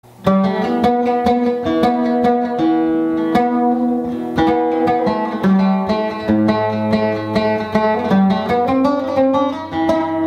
Oud; ساز عود

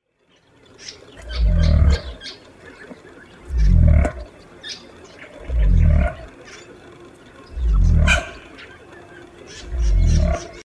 cassowaries; صدای پرنده کاسوری

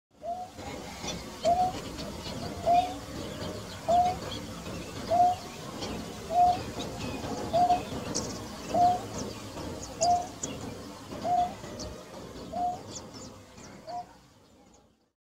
Greater Painted Snipe; پاشلک بنگالی

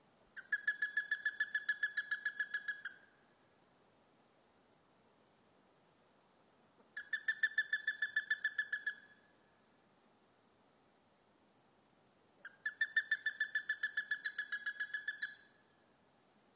Plumbeous ibis; پرنده اکراس سربی

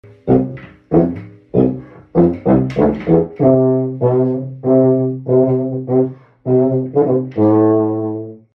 Wagner tuba

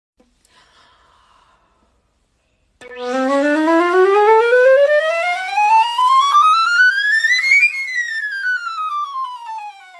Alto flute; فلوت آلتو

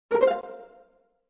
electronic payment; پرداخت کردن الکترونیکی

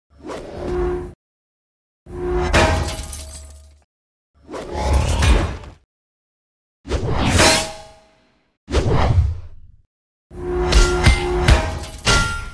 shield

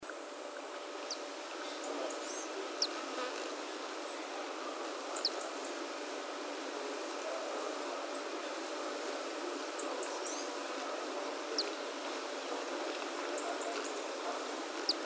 Frilled coquette

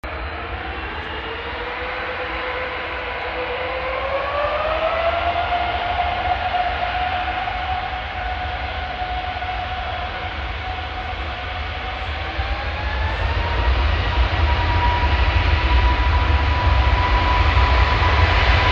Airbus; تیکاف

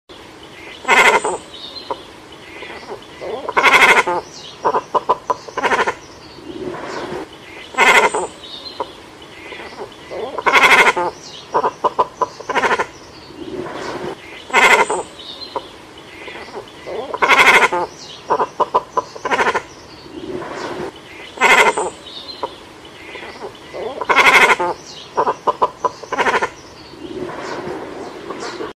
Cormorants

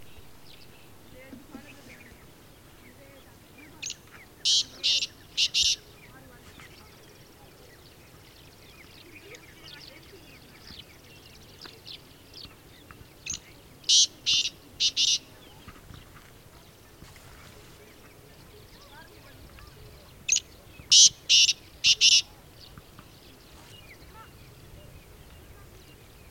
Black Francolin Francolinus francolinus

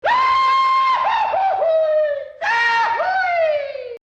Animated scream

